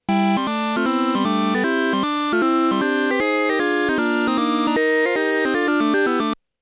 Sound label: Game Start Music; Galaga; Namco Classic Collection Vol.1; arcade